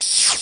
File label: Teleport